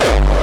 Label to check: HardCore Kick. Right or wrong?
Right